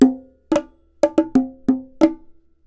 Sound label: Bongo 90 bpm C